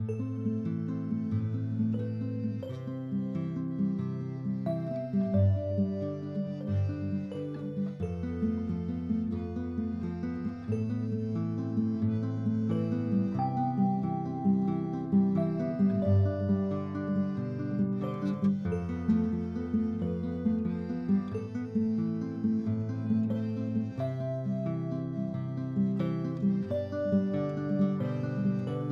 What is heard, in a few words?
Soundtrack Guitar Akkordeon Epiano; Gamjeam